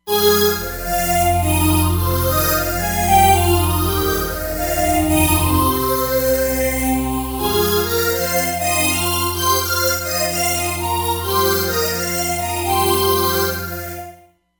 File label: Rave Stringy; Roland Fantom XR